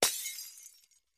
Light Bulb Breaks; Distant Glass Bulb Smash And Shatter. - Glass Smash